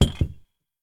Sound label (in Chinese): 递过来的酒瓶撞击声; 环境音; 非循环音(SE); Eustia